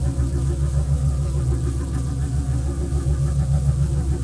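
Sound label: fueling Beam loop, machines mechanical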